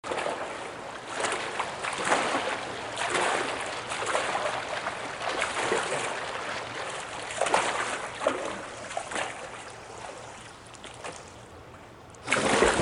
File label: crawl